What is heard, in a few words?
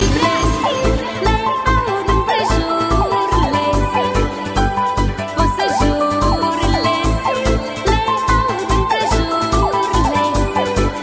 dance